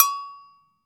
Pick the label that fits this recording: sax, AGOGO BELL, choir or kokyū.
AGOGO BELL